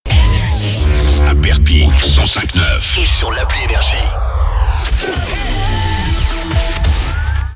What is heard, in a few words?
JINGLE; PERPIGNAN, 105.9 FM; NRJ